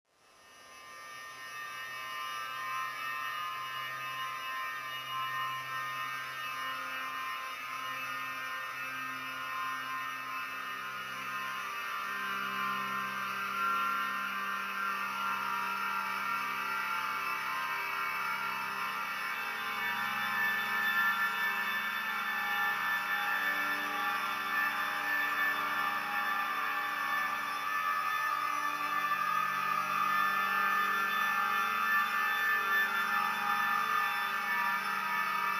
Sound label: stems